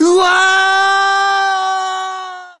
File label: ooow wwww aaaaaaaaaa